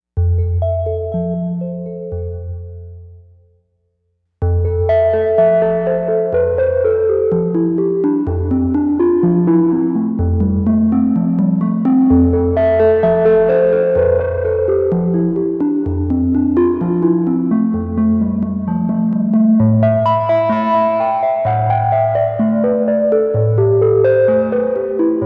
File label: synth, warm feeling